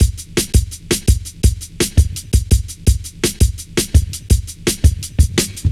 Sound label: Fat Stepz, Drum Loops 167.5; Zero-G Jungle Warfare 1, 2 & 3